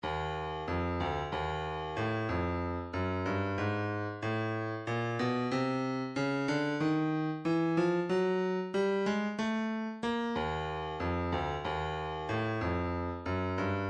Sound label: 120 hihat; Slide